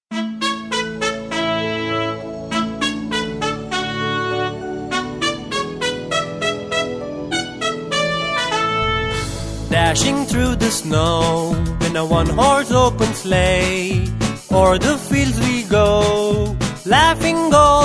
Jingle Bells with Lyrics, Kids Christmas Songs, Christmas Carols